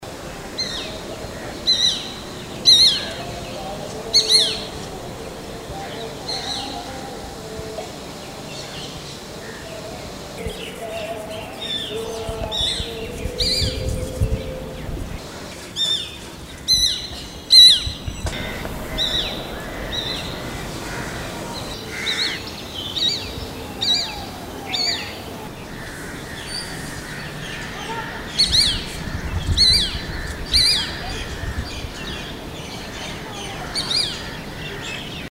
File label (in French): epervier shikra